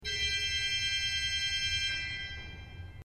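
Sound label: mixtur C, organ; srednja vas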